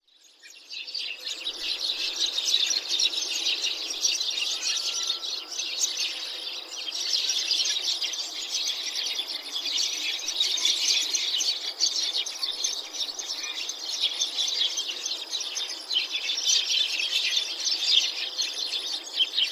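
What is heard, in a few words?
Eurasian Tree Sparrow, saitama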